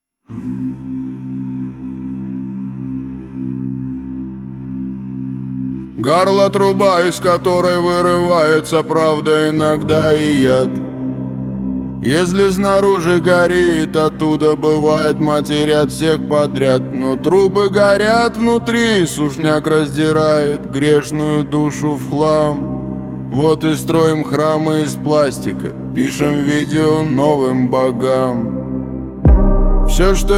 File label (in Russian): Труба